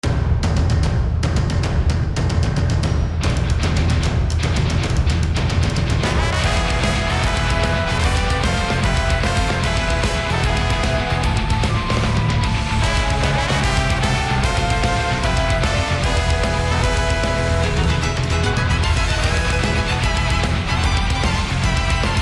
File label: Battle - Arena (Upper Balcony); paper mario the thousand year door; Glitz Pit